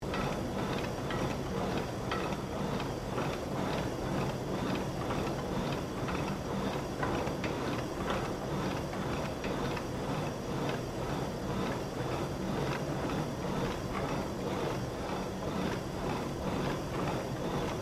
Gym Crosstrainer Operating Loop; Various gym machines; Sports; Equipment

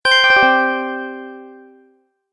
Turn Off Device, beep sound effects

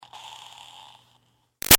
Steam Release Iron Breath Creaky Short; Miscellaneous Household; BigRoomSound

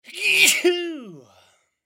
Human Sneeze, Human Sneeze Funny; Cartoon

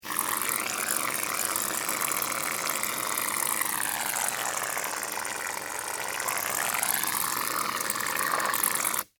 Mousse Can Spray, Household, Mousse, Can, Spray; Spraying (Sound Effects:Objects); BlastWave

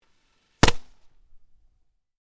FIREWORKS CRACKER SMALL SINGLE METAL PIPE; Variety of fireworks being detonated

